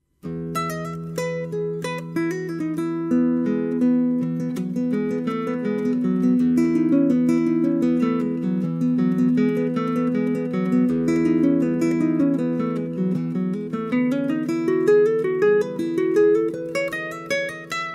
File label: Partita, guitar; J.S.Bach